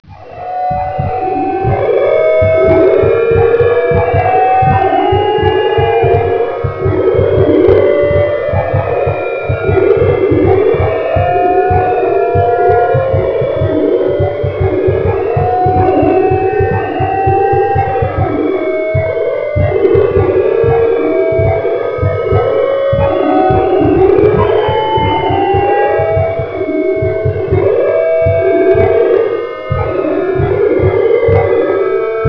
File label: ambient